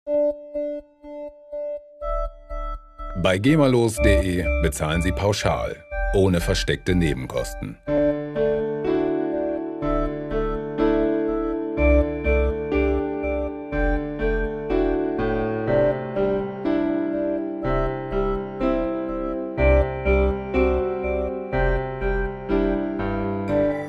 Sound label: sorrow piano